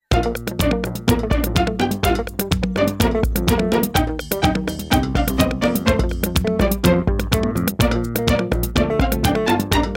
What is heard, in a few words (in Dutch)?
Muziekje